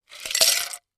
Drinking glass is filled with ice cubes. Rattle, Ice Glass, Ice; glass clinks